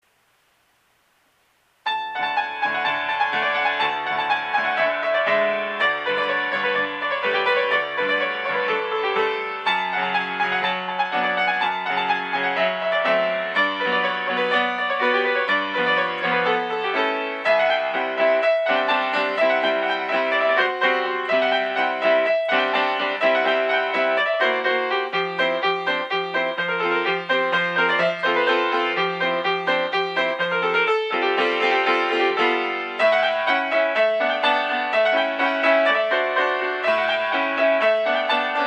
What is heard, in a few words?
rumanian folk, piano